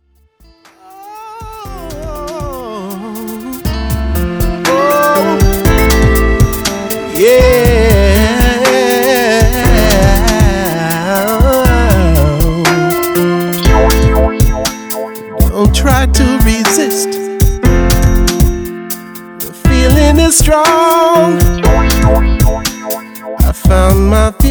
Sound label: Cry